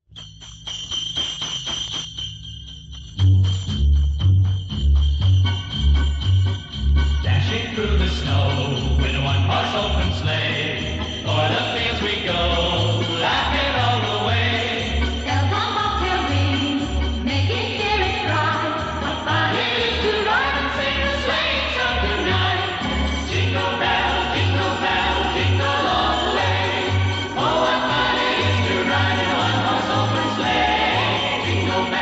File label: jingle bells band country christmas